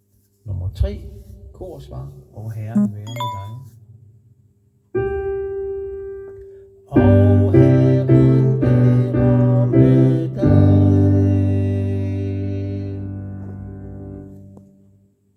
og herren vaere med dig

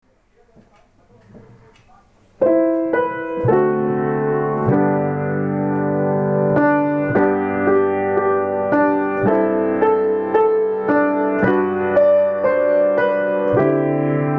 spaend over - melodi m. akkompagnement

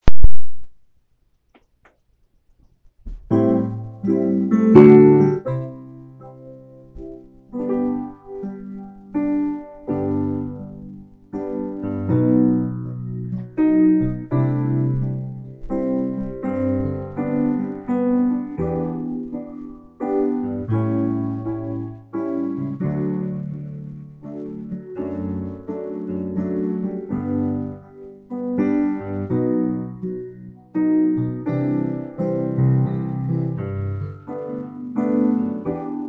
amazing akkompagnement

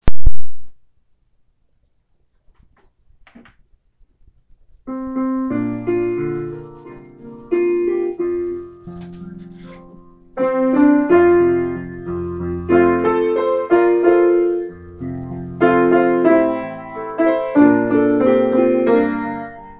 maa din vej - alt stemmen

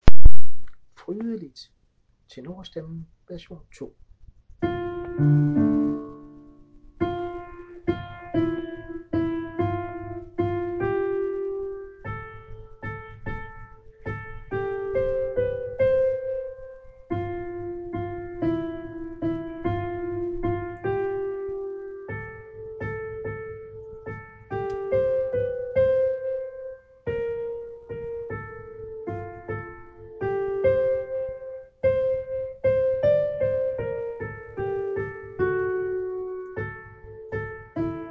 frydeligt tenor

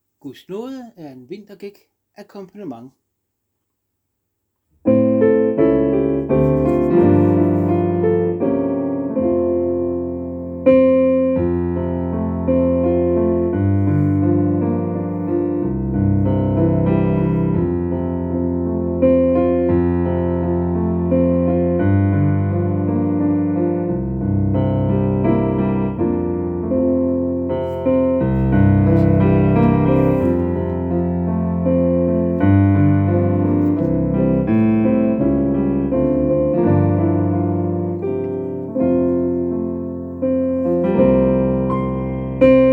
guds naade - akkompagnement

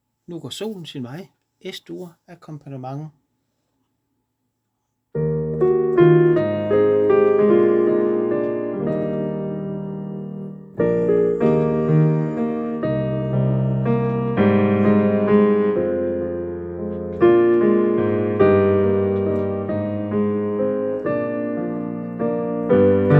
nu gaar solen - akkompagnement

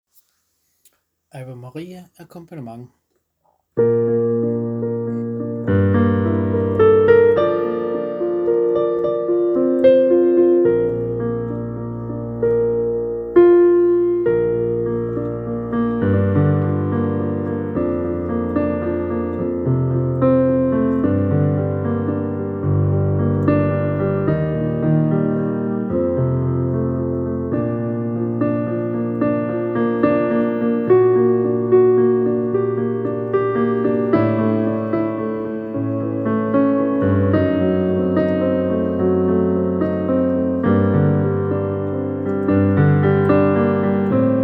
ave maria akkompagnement

